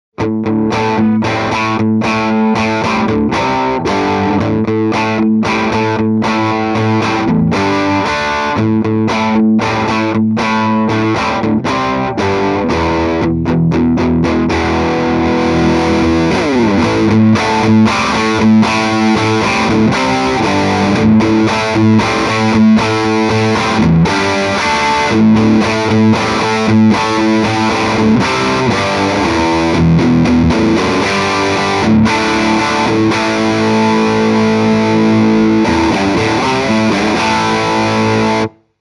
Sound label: Les Paul Crunch to Heavy